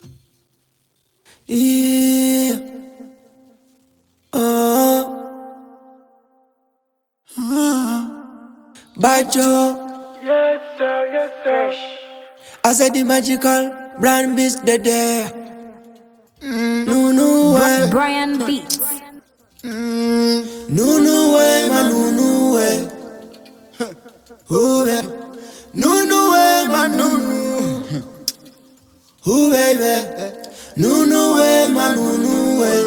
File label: Vocals